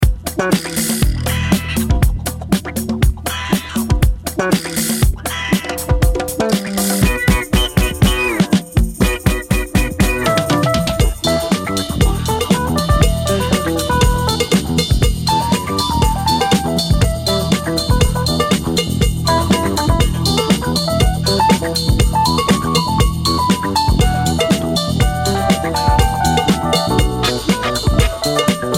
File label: Instrumental; Obscure Italian Disco II